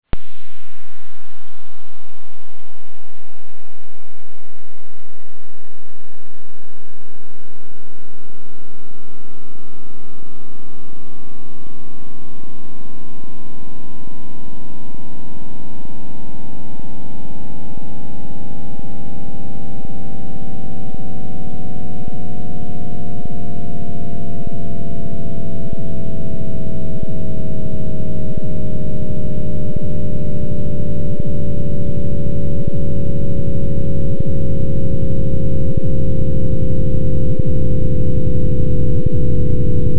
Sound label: Big Bang Sound